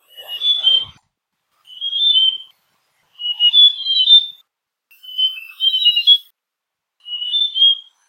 White tailed Robin calls, Cameron Highlands Malaysia